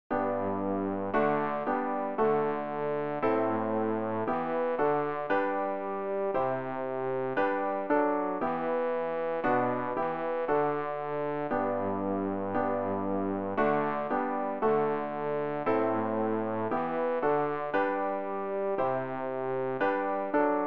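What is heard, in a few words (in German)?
bass, Übehilfen; Ref. Gesangbuch; RG 84: Aus tiefer Not schrei ich zu dir (Strassburg)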